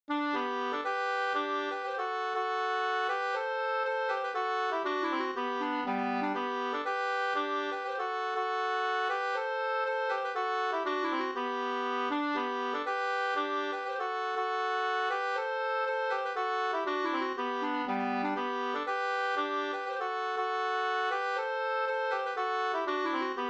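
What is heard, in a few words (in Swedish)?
polska, tvåstämmig; Kumla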